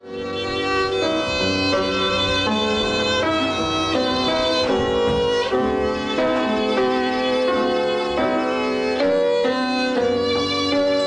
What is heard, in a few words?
Piano and violin prelude